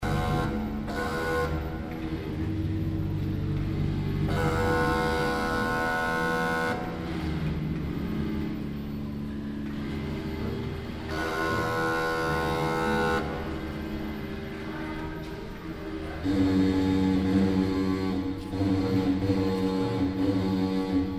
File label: uber organ